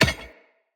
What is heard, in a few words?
Orb Cannon Drop Impact, Powers